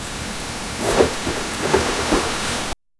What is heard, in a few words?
shaking quickly the body, bed